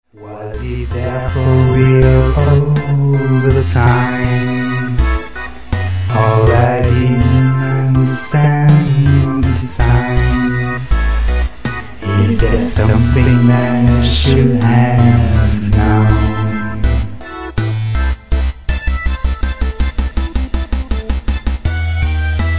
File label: something should vocal